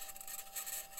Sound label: mic, mars2020 edlcam